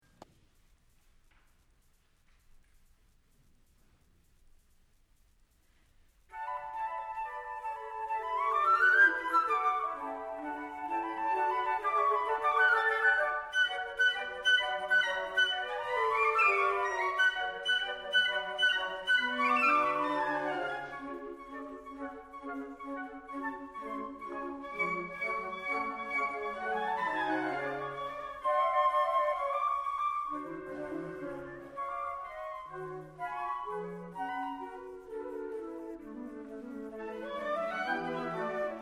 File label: tarantella; song without words